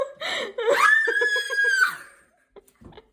high pitch scream

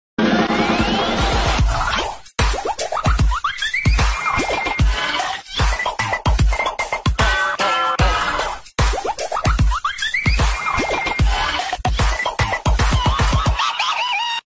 silly ahh beat

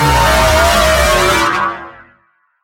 jumpscare sound; jolly project j

